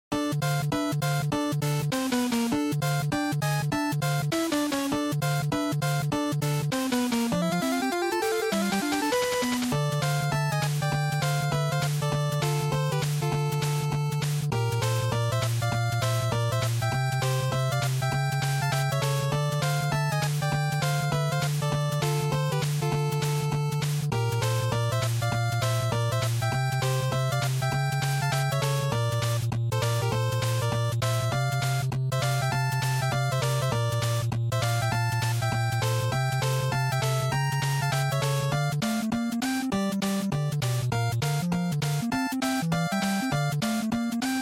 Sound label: NES Action Stage